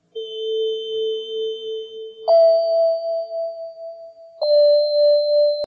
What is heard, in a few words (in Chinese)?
音乐铃; 报时; 报时430